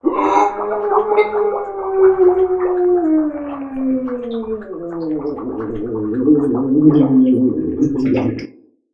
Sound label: drown; human; NS Studios - ultra sound collection